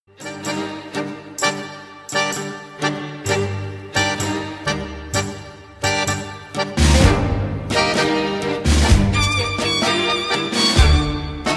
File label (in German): klingelton tango argentina dance